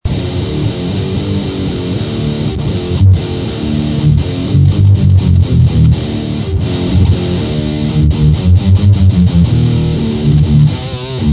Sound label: GTS90 clean + Metal Zone